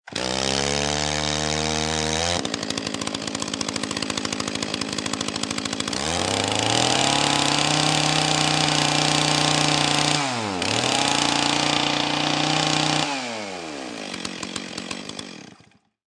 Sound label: Chain Saw Start Run Rev Long Turn Off; Lynne Music